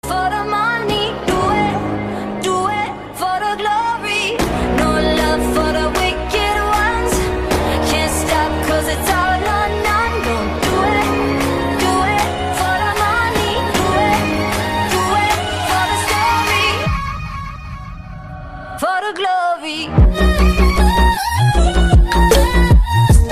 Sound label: electro